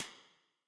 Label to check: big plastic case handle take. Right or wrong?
Wrong